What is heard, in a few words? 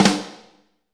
SNR, Snares, Roland; Northstar - Drumscapes Roland